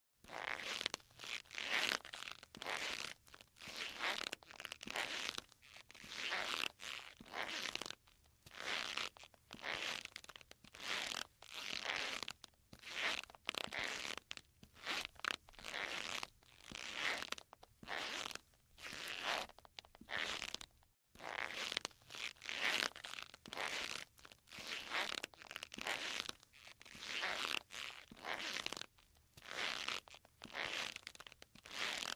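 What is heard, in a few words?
Leather shoes, squeak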